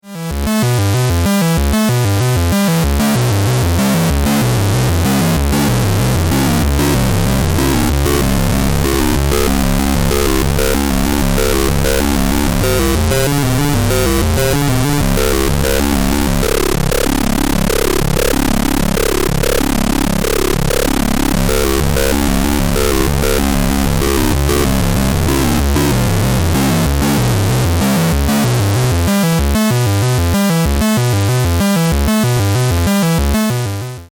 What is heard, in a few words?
a111 lin fm; 2台のA-111の矩形波同士によるリニアFM変調